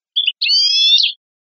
quejas; chipaillo

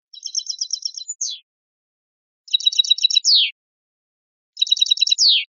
terminacion chacheada

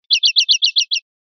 rep chachases, pardillo

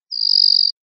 grillera, verderon

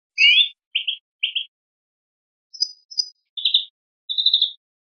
faltas jilguerada, verderon

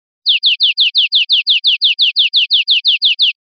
golpes corridos tiotio, verderon

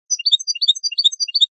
floreo; campero; tibali tibali

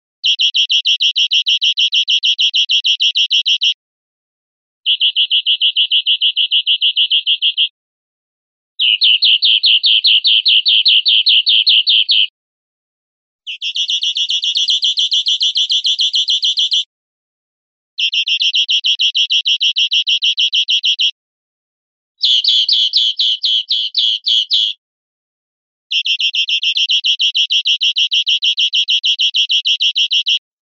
chones corridos enrabietados, verderon

